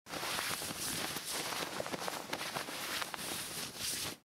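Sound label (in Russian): выравниваем вещи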